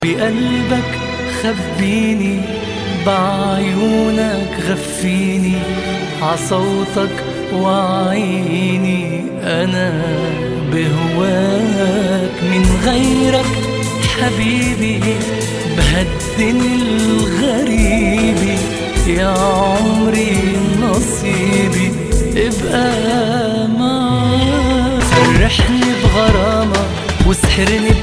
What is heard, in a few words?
Arabic Love Song